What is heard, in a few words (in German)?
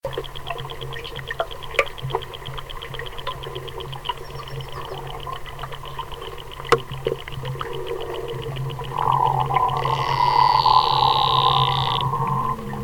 subaqua gumpenklang; donatus; donatussee; klaus osterwald